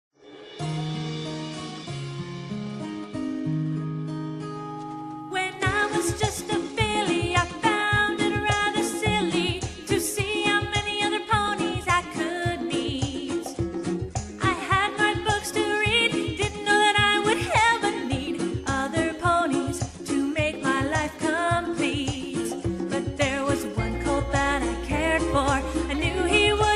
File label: Dub